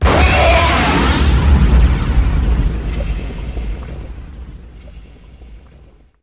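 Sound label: combat explode tie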